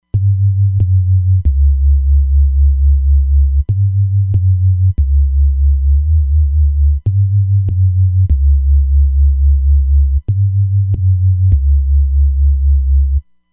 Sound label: FS Jungle Bs, Roland Fantom XR